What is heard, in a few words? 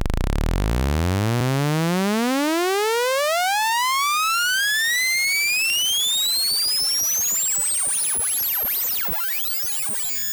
saw sweep